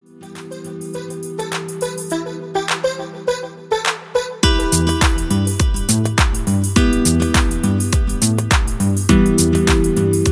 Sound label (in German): musik hoerprobe